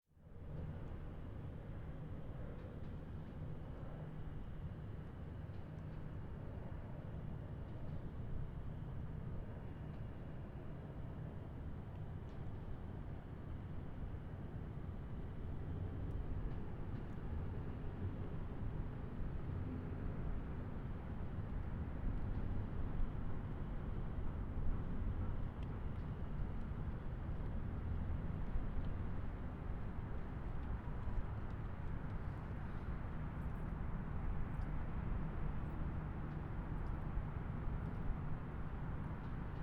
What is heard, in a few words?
highway near waterfront distant